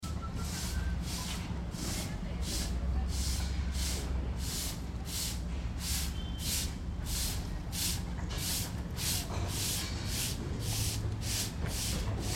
Sweeping The Streets, Sweeping Whistling Setting Up Market In Mexico City; Barriendo Las Calles